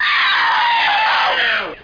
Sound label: banshee